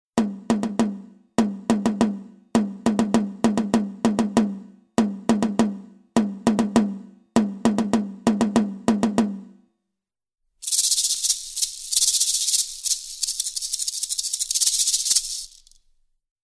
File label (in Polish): rytm bebenek marakas; druzyna marzen; 4-latek; instrumenty